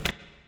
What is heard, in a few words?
spin Button Down